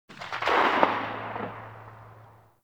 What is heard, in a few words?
Whip Crack Tail; Oraxia Whip